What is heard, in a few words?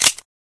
g3 out, weapons; svencoop; st mission